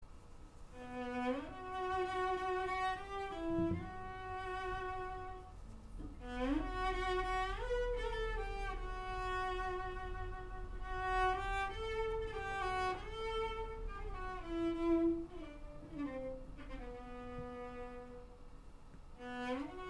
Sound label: camel train